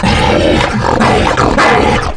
hound sight; Zaero; monsters

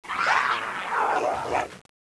jaguar; 12000 Graphics Pack - A Complete Graphics Collection For Your PC

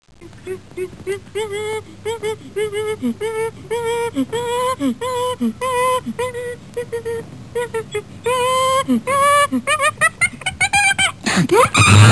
Monkey Chatter

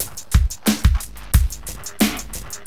DM LOOP, Hip Hop, Drums; LiveAct Pool 1

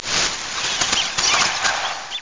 firework

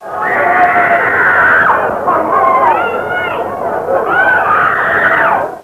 crowd scream

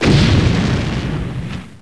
explod; thexder

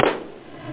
clap; da capo vol1; drums claps

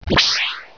size up; pong

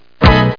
error

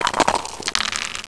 3 dice

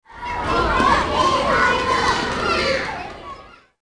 kids, residential

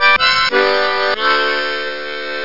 Chord Harmonica; DeluxeMusic2

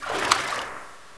wade; mordor

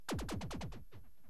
atom gun

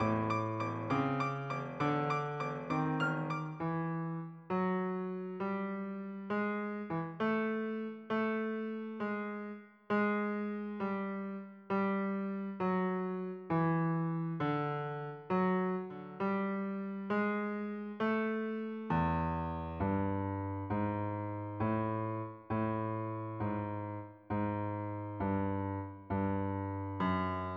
BLUES JAM

